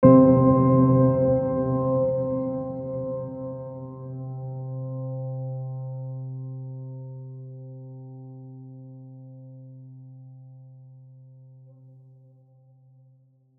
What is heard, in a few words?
piano